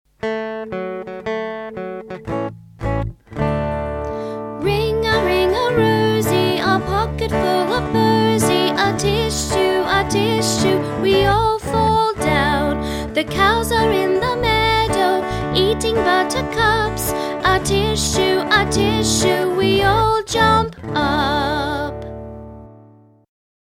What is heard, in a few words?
Ring A Ring A Rosie; Childrens Rhyme; StoryTime